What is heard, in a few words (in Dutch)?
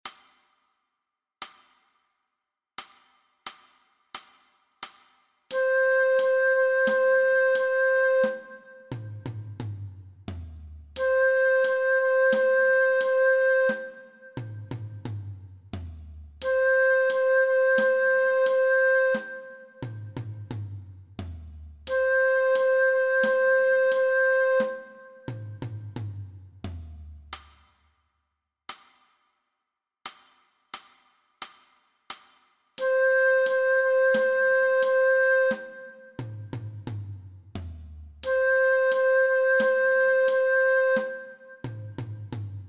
MEESPEELTRACK Dwarsfluit alternate; Dwarsfluit aangepast; Nij Talint Orkest